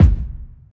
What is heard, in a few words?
tight lows kickdrum g sharp key, steel kick drum sample tuned to the G# note